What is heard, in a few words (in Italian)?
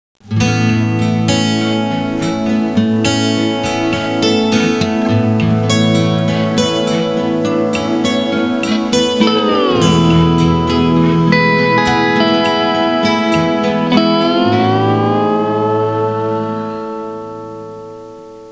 suonerie musica hawaiian